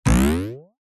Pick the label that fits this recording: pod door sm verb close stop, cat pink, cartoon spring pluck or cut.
cartoon spring pluck